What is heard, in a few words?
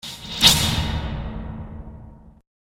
Whip Of Darkness; Evil Sound Effects; fantasy sound effect; role playing games, magic videogames